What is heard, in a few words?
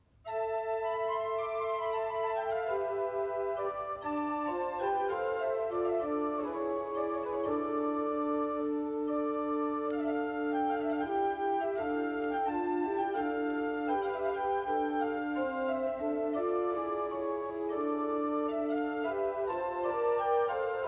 Buxheimer Orgelbuch, organista; O Dulcis Maria; Livio Vanoni